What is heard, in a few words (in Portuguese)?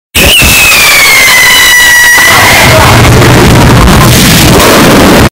foguete alto